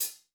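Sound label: brush soft; Northstar - New Gold VOL-2; 30S BRUSH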